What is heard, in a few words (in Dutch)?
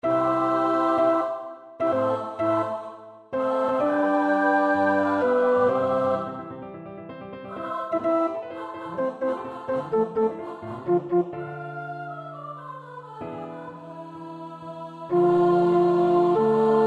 tenor 2, koorpartij-oefening; Hierop besloten al d'aanwezigen; De Schipbreuk; Wagenaar (Johan)